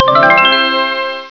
sparkle; TinyTown

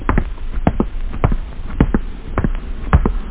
steps

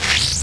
Protoss Menu Popup; BroodWar

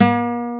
GTR A#3, Guitar

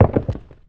bounce Volcano; Action; ThinkTanks

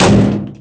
body fall metal; Spearhead Demo

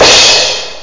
crash cym; Skwishy

